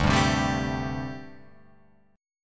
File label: D 7th Suspended 4th, down, Guitar, Open F; strummed; JGuitar